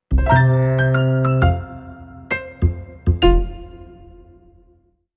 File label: Happy Hodge Podge, Sting 1